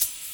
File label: KIT, Dance Mega Drums, HIP; Best Service